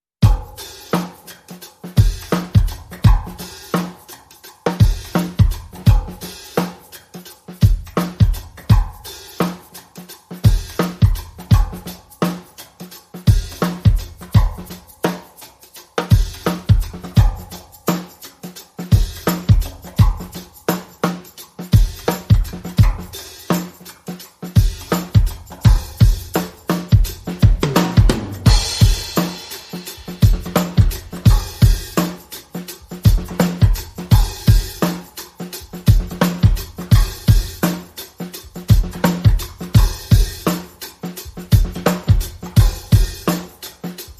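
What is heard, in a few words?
Drums and Percussion Only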